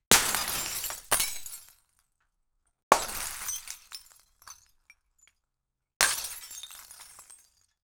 loud metallic crunch glass, glass break

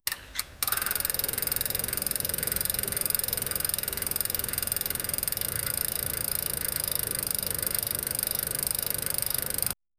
bike start sound and runn

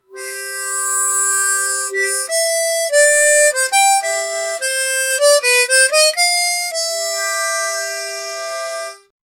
the tune, harmonica

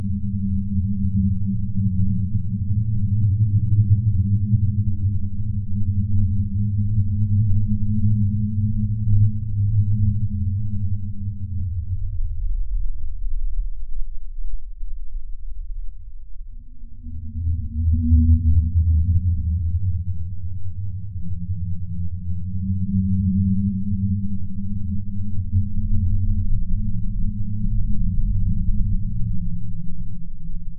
ambient on alien planet s, mushrooms

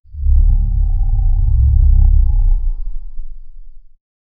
clicks

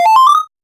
poison effect rpg game

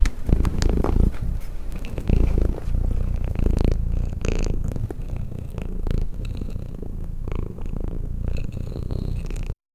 cat picking up the mangos; domestic animals

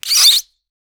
when clicking on the mush, deformation

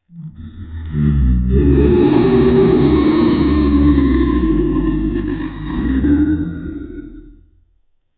beast roar

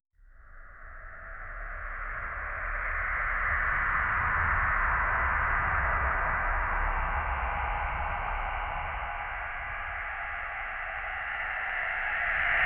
deep wet guttural growl; abyss

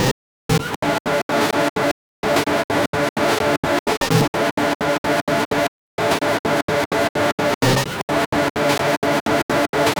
correct, button

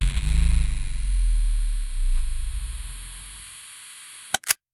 camera photo flash with a; cinema

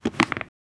sound effect of a single, table

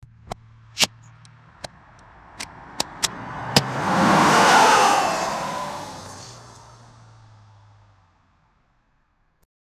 sound effect of a man, accidents

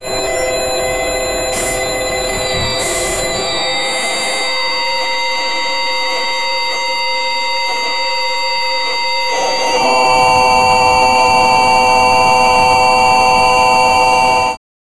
the scary sound of an, electronics

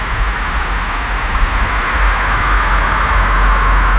traffic noise through the

